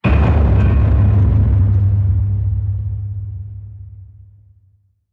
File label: Structure Blunt; Damage